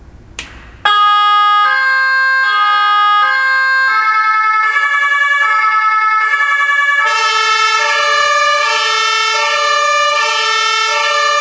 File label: horn